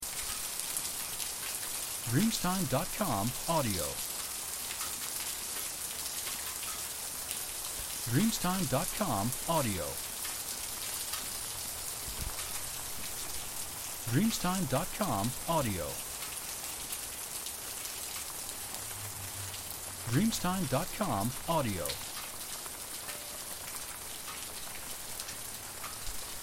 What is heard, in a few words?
Rain Hitting Ground